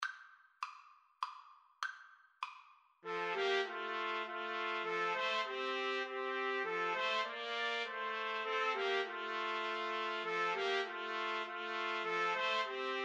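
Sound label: trumpet, karaoke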